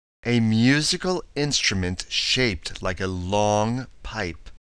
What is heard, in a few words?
clarinet